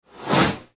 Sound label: flange whoosh; transitions and movement